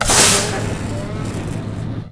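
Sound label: elevator exterior door close; doors elevators; Star Wars Obi-Wan; XBOX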